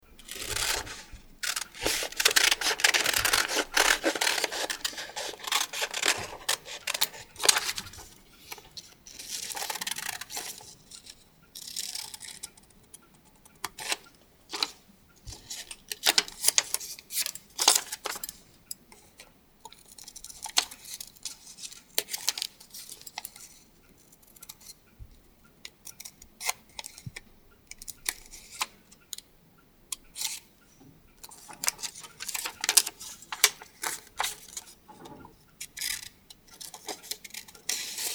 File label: scissors